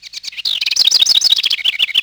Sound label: Bird; Project Gotham Racing 2